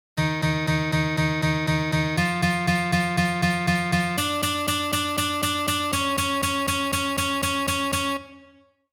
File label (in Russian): Uprazhnenie dlya igry oktavami